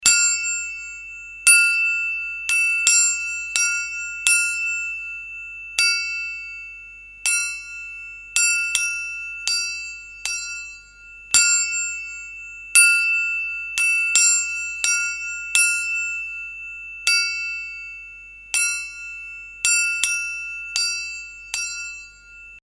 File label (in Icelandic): þríhorn